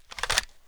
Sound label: vollmer close; mg; garrysmod